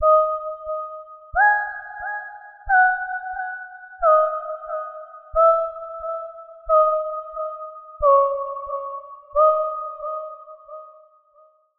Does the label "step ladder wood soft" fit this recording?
No